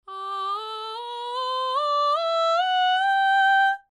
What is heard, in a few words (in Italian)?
Scala A